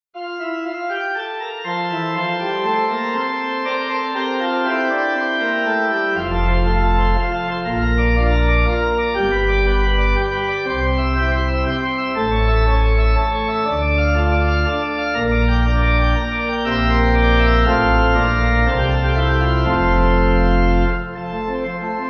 F Maj, Pipe; Bach

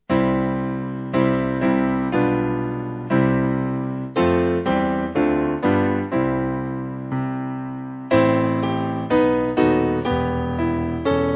Spirit Of God, Piano; Morecambe

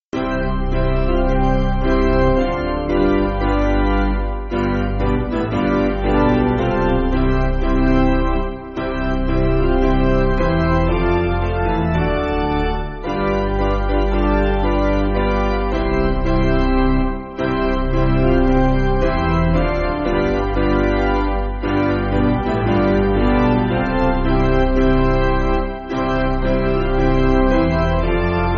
Piano, Backing Files for Churches; Basic Piano & Organ (CM)

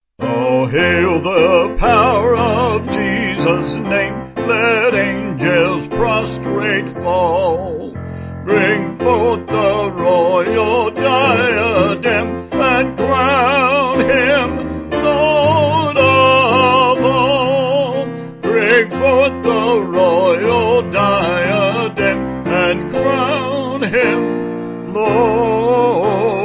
vocals piano; All Hail The Power; Coronation

